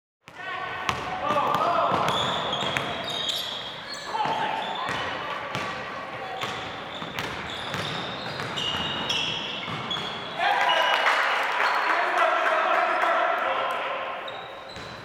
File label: basketball Sound